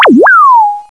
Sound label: RADIO WAV; HIGH TECH